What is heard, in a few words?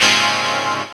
CHORD, Drum & Bass, 130 BPM; Ueberschall; Drum & Bass Flash Trackz